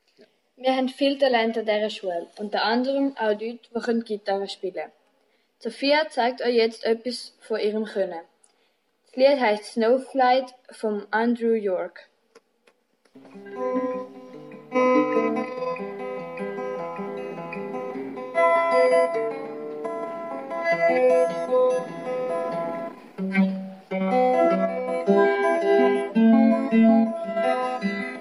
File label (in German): Gitarrensolo; Marthalen